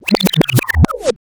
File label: spin tone, digital; Nokia; X5-01